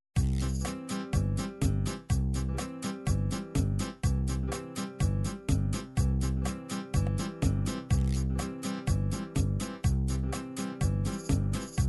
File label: latin bolero, midi